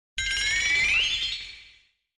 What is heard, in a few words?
surprise sfx; DBZ